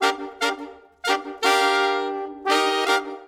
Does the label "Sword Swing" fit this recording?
No